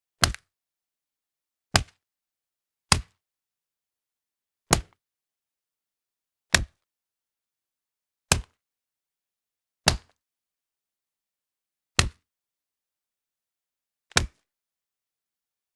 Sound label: Punches